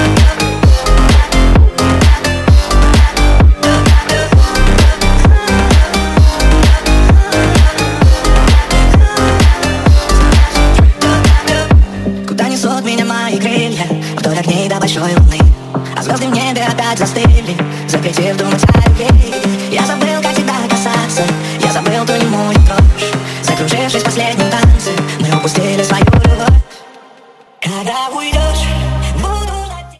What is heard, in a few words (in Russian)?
phonk remix rington